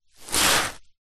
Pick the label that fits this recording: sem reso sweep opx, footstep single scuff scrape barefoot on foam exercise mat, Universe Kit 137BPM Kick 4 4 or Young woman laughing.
footstep single scuff scrape barefoot on foam exercise mat